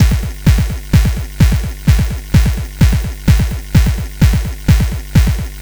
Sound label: Game Boy Kick Loop, 8-Bit, Drums